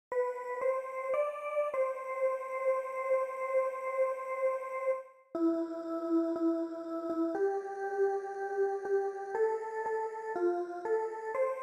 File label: o holy night soprano